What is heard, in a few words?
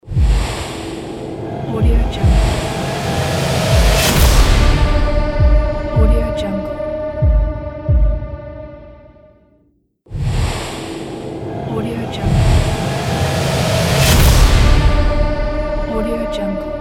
mystical logo